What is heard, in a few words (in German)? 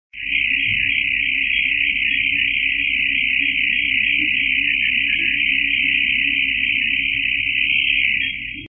DCF Audio gestoert